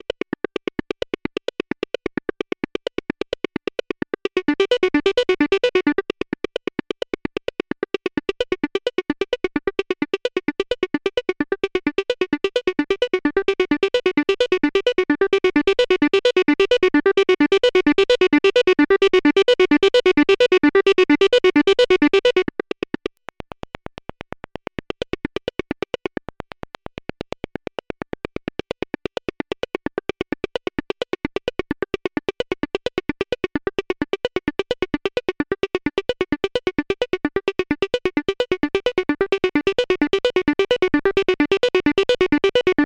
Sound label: ACL System 2 ACL ENV Decay in Long and Short Mode